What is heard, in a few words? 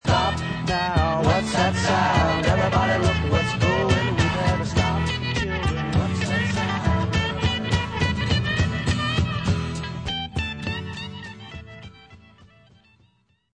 guitar